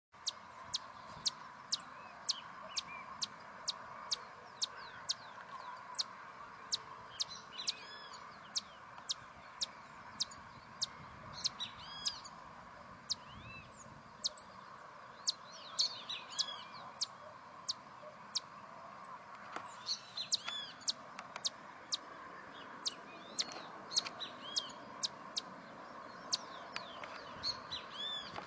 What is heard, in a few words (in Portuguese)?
Canto da violeta de vento branco